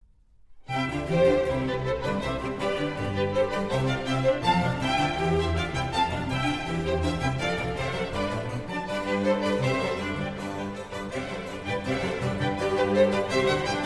Concerto for Strings and Continuo in C major, Allegro; Vivaldi